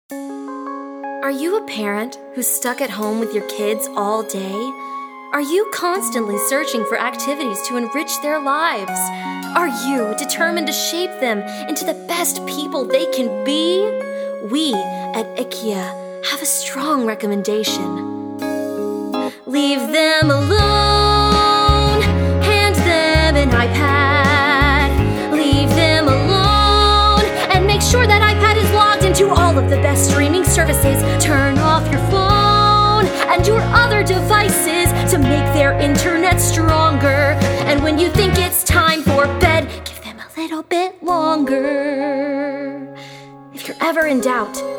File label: VOCAL